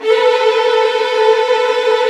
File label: WH TR A4, Violin; Q Up Arts; Denny Jaeger Master Violin Library; PERFORMANCE